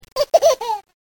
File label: laugh